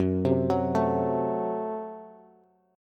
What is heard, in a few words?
Gb Diminished, down, Bass; arpeggiated; JGuitar